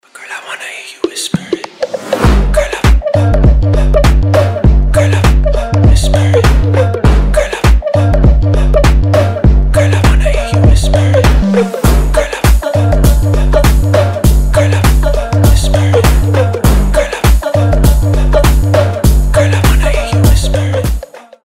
whisper

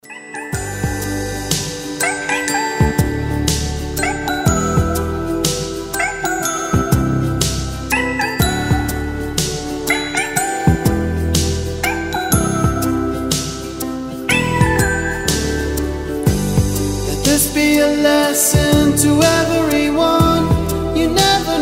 xylophone cat

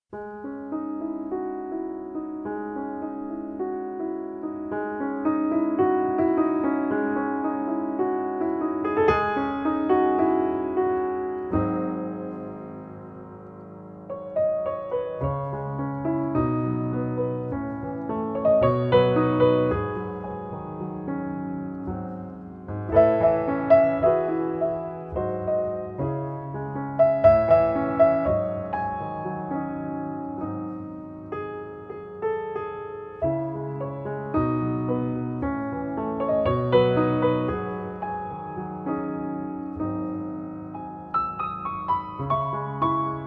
instrumental music

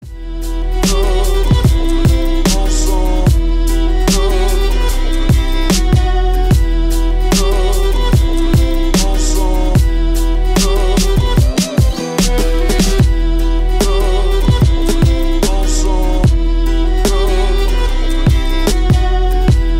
instrumental

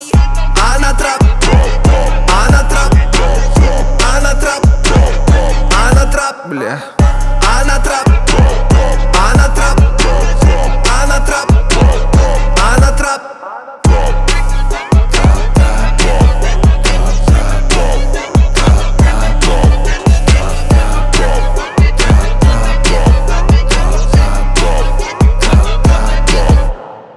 thai trap

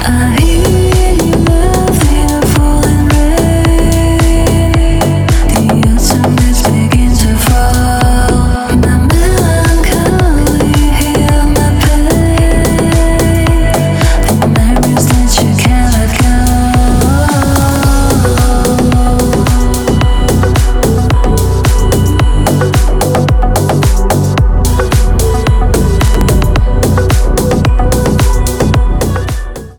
melancholy

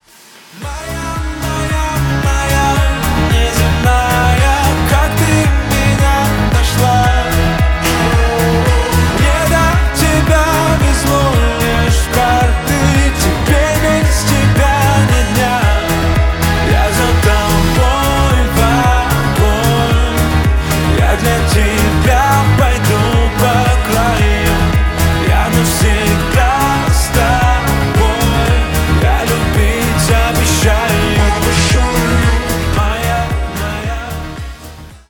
acoustic live

